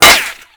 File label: impact metal; weapons; Modern Warfare